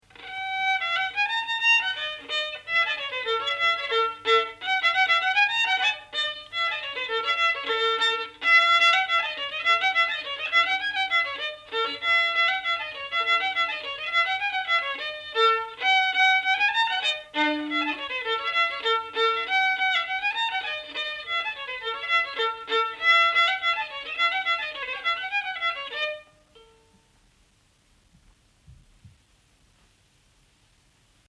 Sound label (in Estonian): Ruhnu viiul folk; Kockleiken 2 (Kokalugu 2); Elias Schönberg